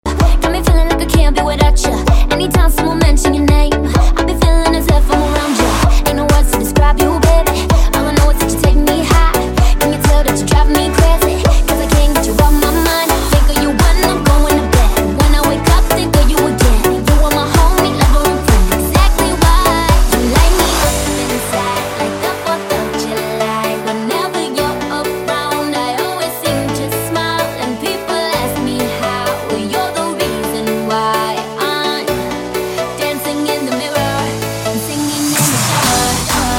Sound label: Club Banger Remix